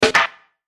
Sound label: Chime Percussion; Multimedia Web Interactive; Hits (Sound Effects:Musical)